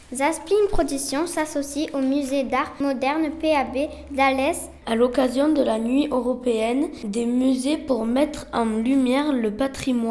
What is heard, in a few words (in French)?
jingle radio enfants